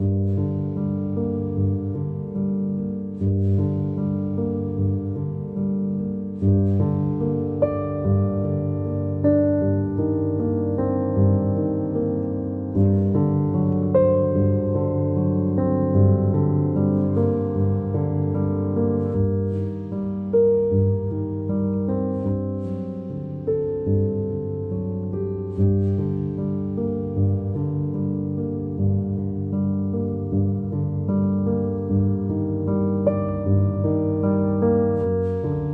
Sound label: song calm nature; dolphin tunes